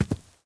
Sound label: grass walk